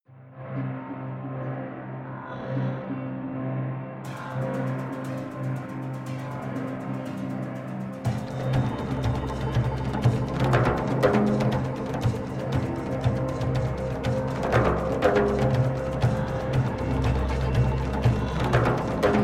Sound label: small perc and pads